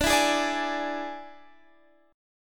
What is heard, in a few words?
D Suspended 2nd Flat 5th D Guitar Standard; 22,19,18,19,21,x; strummed